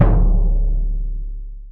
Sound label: Bd Deep